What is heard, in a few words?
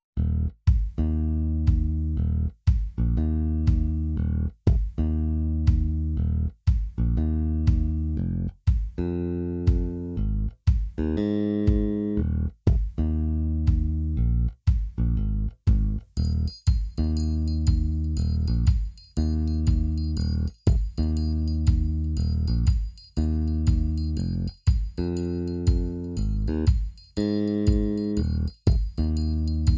Drums and Bass Only